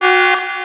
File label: alarm